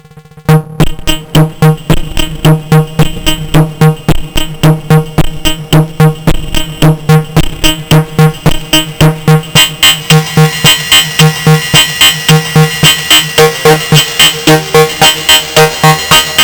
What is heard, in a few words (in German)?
AnamonoXmini VCF moduliert Hall via CV out; Gotharman; Pluggy; Stretcher